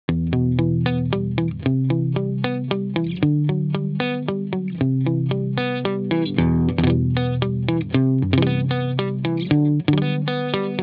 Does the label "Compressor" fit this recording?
Yes